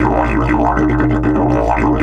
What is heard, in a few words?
120, Didgeridoo